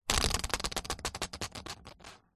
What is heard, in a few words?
leisure game spinner plastic on card push spin long